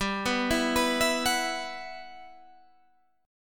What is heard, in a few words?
B Suspended 4th G, chord; Bsus4/G arpeggiated; JGuitar